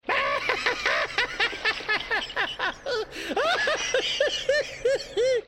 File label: crow laugh